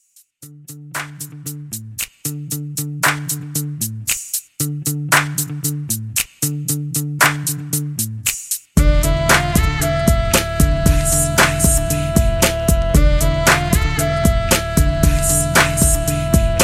Mashup 115 Bpm

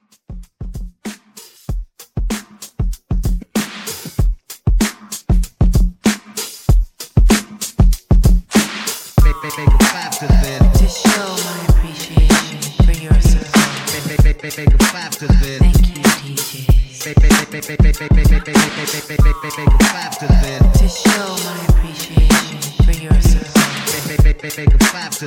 Club 96; Club Edit

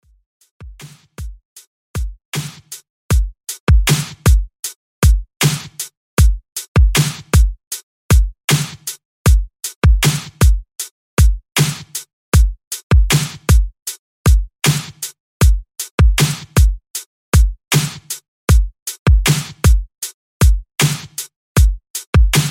Christmas Reggae Redrum 78 Bpm

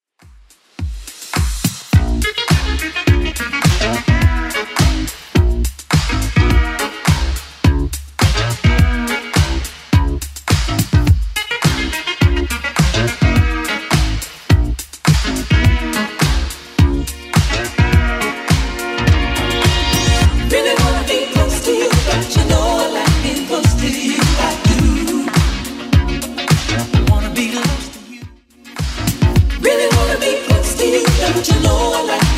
80s Club Edit 105bpm

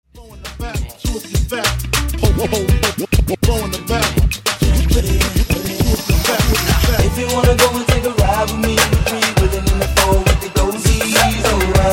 Funk Downtempo Remix 101 83Bpm

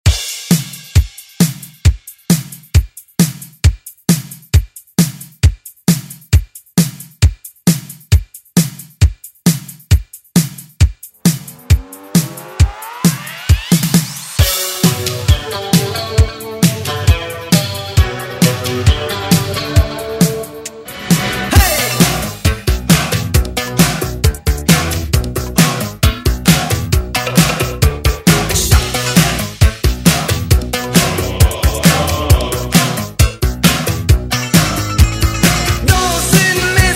80s ReDrum; DJ Mhark

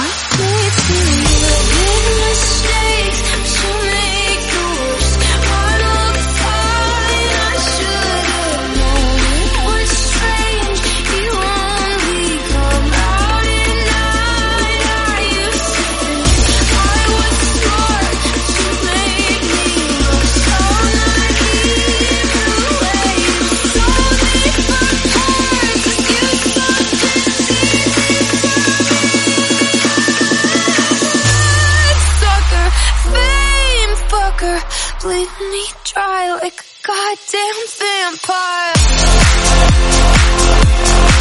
128bpm Bb

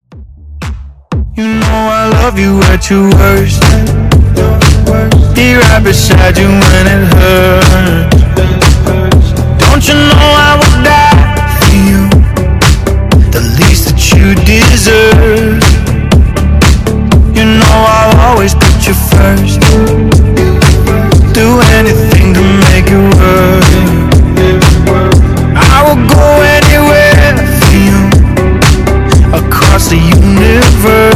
House Redrum

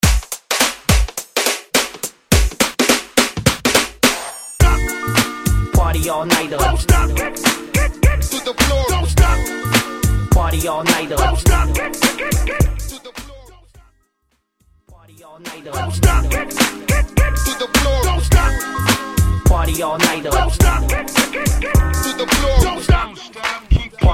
2000s Mainstream Clap A Pella Starter Hype; Mainstream VIP; Vegas Edit

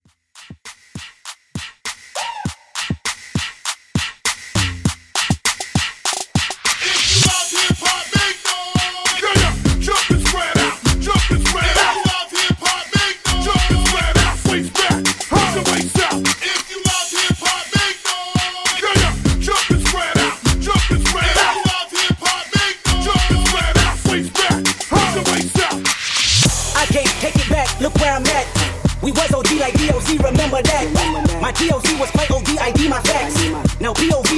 Twerk Hype; Remixfreakz

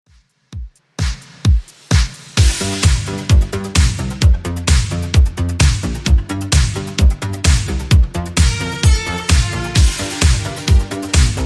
80s Redrum 130bpm

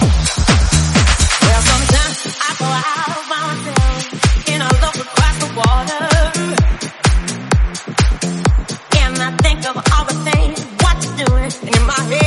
128bpm Eb

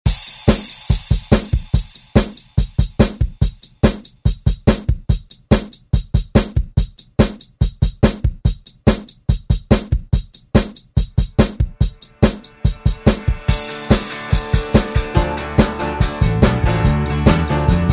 70s; ReDrum

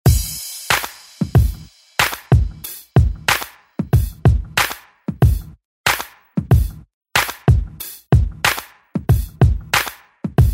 90s Redrum; DJ Mhark

